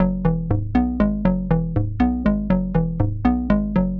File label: SH101 Arp (120BPM), retro house